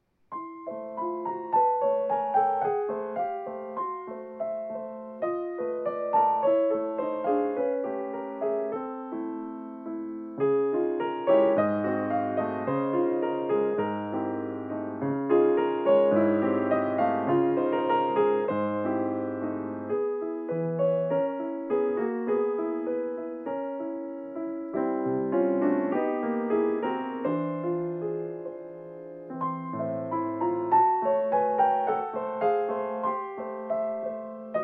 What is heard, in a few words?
piano improv; Poulenc Improvisation #5; Francis Poulenc; four-hands